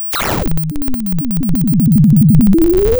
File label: pop